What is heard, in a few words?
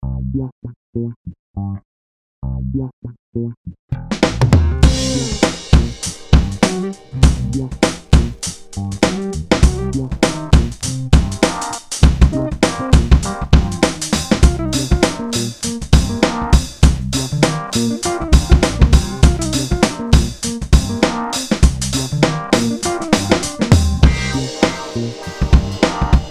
Garage Kit